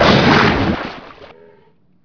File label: snap miss, ichthyosaur; hl2